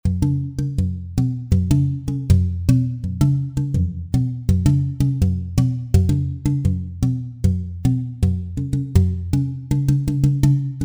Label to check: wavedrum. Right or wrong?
Right